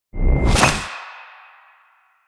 disappear

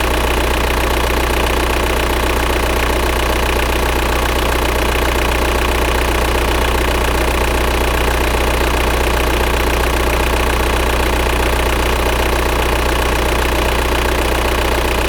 Diesel

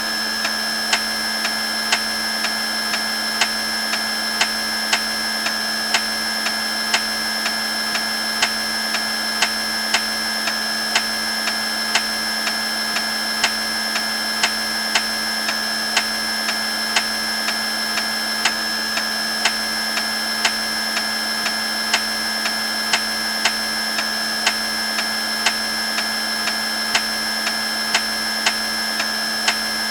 Spin Loop

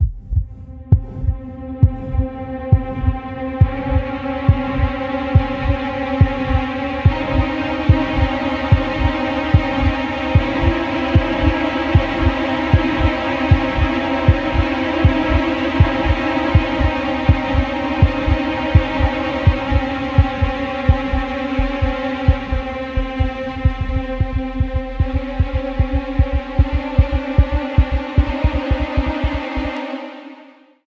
Strangled

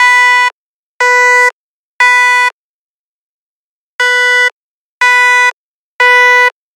Scanner Detected

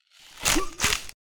Neck Snap

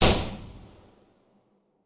Shooting

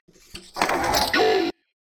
Equip Fast

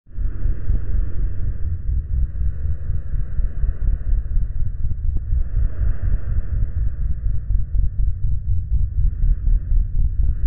Chase Music

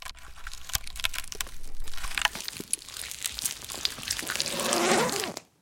Disguising

